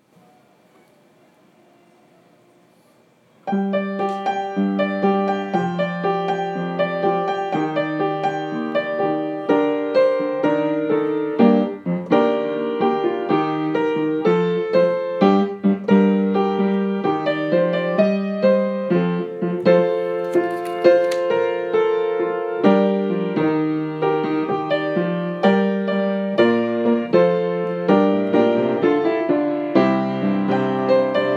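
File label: clap your hands and sing accompaniment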